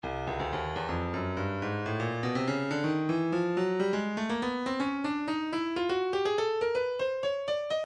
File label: percussion midi files cowbells 123 agogo; Stylus